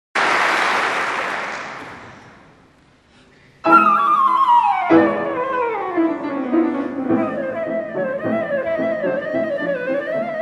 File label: flute; the flight of the bumble bee